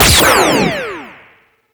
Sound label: Beam End; Troll Protector SFX Beam End 01